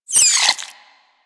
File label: vocal pain, critters; Warframe; Hunting Critters; Nexifera